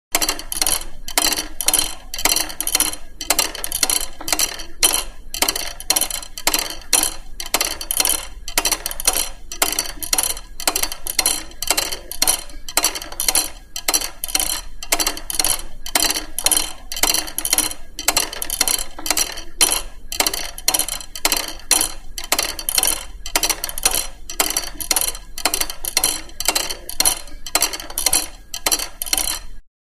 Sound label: Clock 3 Ticks Late 18t